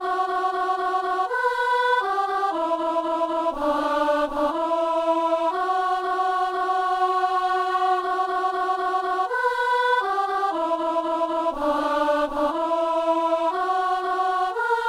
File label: alto; Acclamez le Seigneur